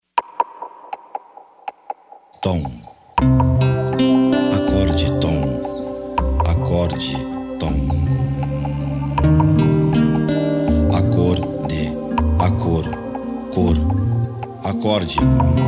acorde

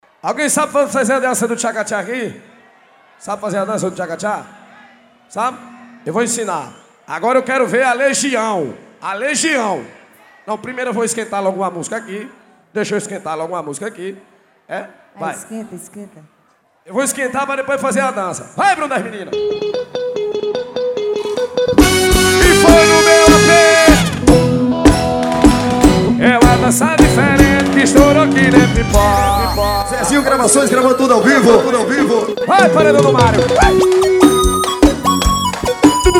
forro pegado; forro pegado em belem pb; zezinho gravacoes

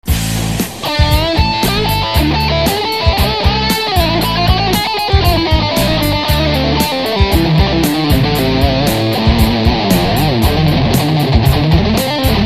meu solo blues

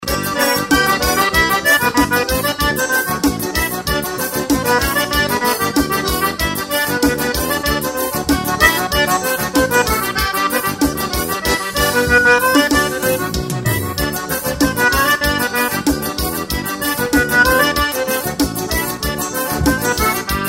acordeon

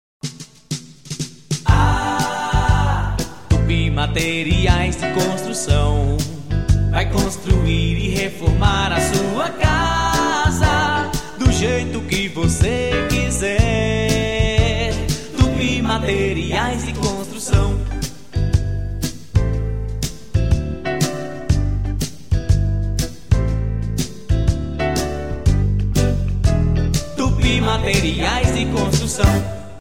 jingle material de construcao; tupi

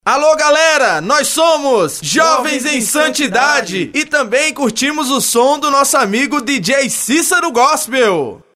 vinheta dj gospel

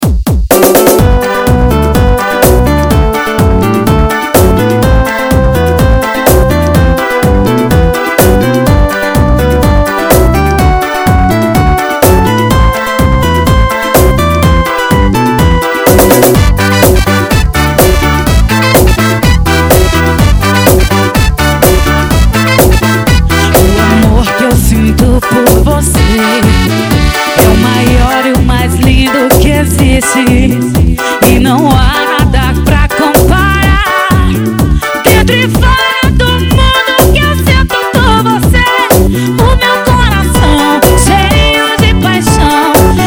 cumbia

